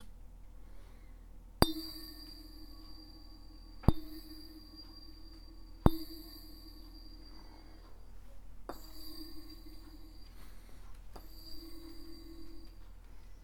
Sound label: Incandescent bulb